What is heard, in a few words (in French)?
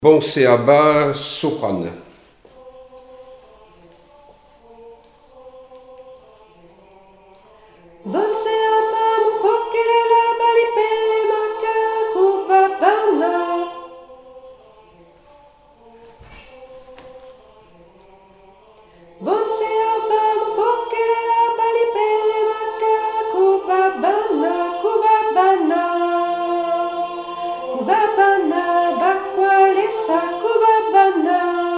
chant soprano; Bonse Aba